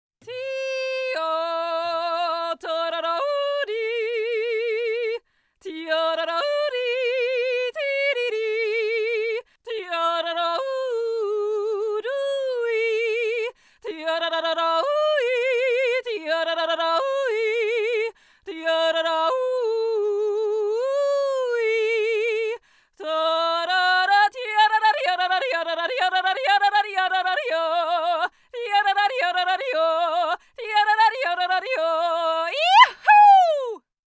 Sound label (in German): Jodeln; Vodafone